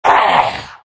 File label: big pain; Dota 2; Undying